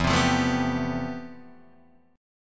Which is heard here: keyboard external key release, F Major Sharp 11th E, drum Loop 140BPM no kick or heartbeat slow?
F Major Sharp 11th E